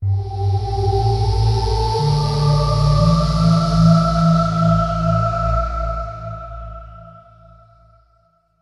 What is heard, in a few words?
Open Big Door